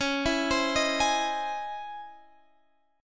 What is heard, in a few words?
Db Minor Major 9th Db Guitar Standard; arpeggiated; DbmM9; JGuitar